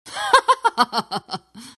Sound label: lina laugh; dota